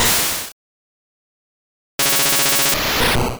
Hyper Beam; sfx; attack moves; Pokemon; gen 1; rby

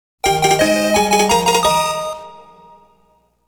Fanfare - Pokémon Obtained

